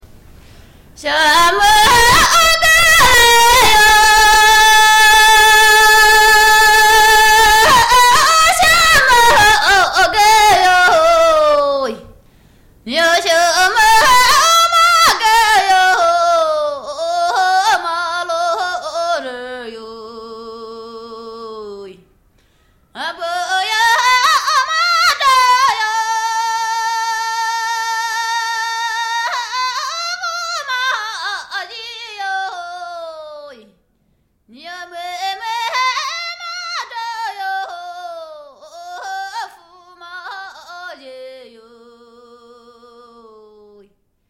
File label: Baji Folk Song